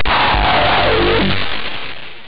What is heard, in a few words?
spawn